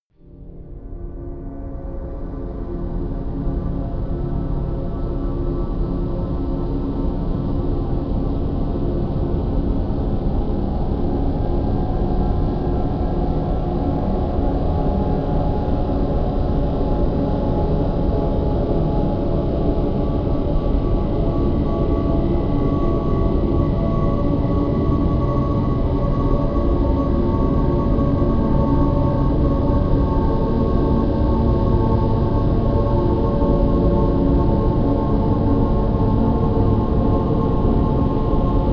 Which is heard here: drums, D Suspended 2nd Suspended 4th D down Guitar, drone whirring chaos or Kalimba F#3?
drone whirring chaos